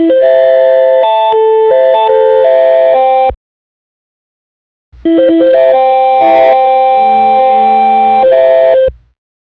disappointing slot machin, negative

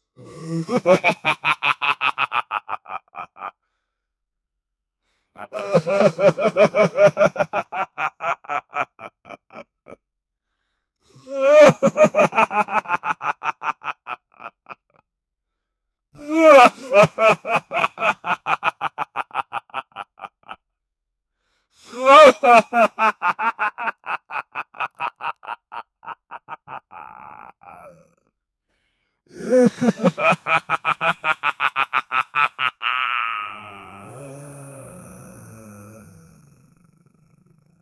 beast man laughing too

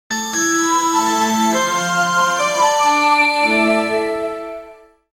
winning musical sound, pirate

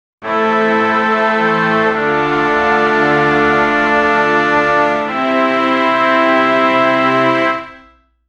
a proud musical anthem fo; astronaut

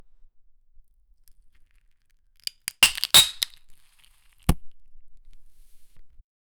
an ice cold beer bottle, beverage

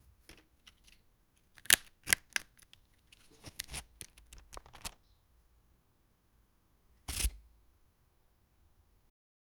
object manipulation

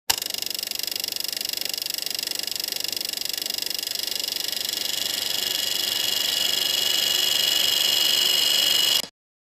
a sound of a starting, vintage

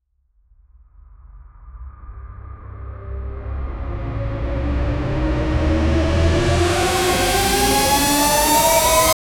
action trailer, riser; hollywood

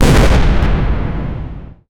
a sudden violent surge of, elemental

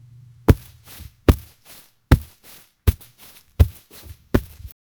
a man taking a run, athletic

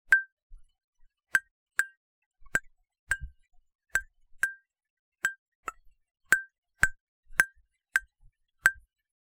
ultra realistic close up, dessert

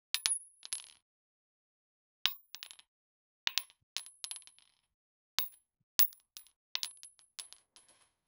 soldier taking an arrow

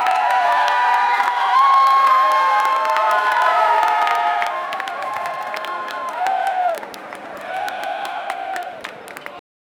energetic cheerleaders ch, cheerleading

